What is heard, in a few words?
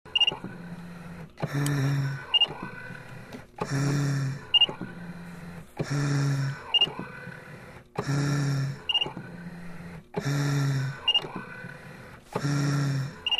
Ventilator Hum Run S, respirator